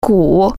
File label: drums